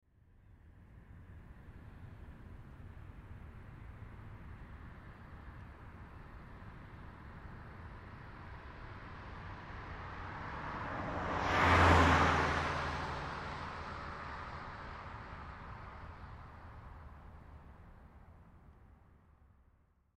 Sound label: Ford Escort 1986 Drive By Right To Left 55 Mph